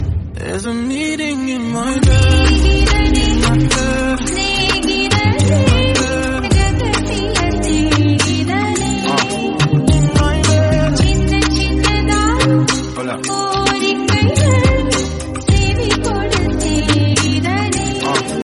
cool remix background musical song tone ringtone

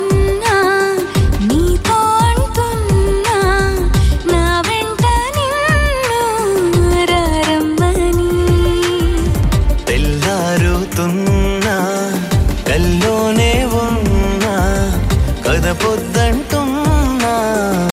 new telugu song music ringtone

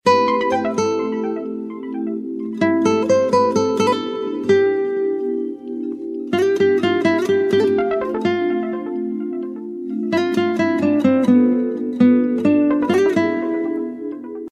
guitar message ringtone

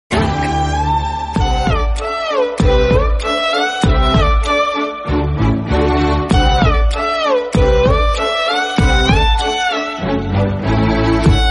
best guitar background music tone

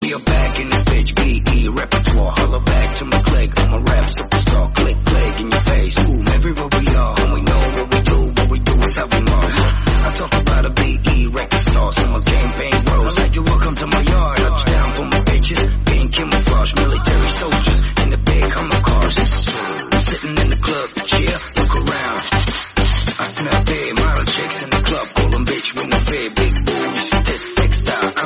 trap music remix oriental ringtone

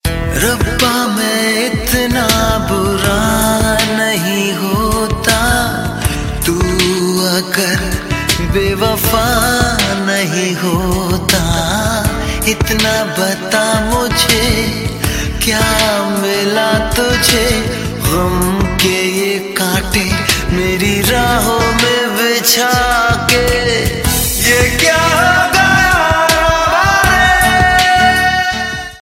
slow sad ringtone; kaante

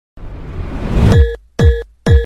rock ringtone

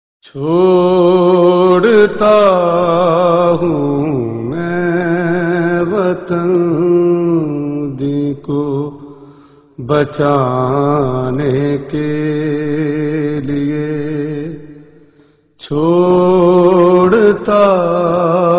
noha ringtone

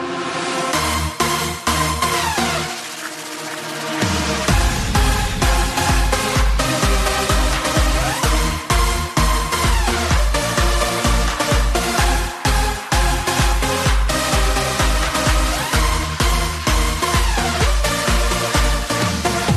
beat drop

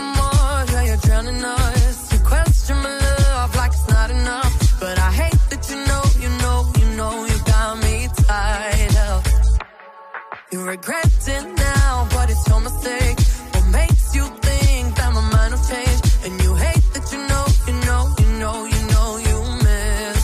english ringtone

